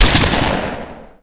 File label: fire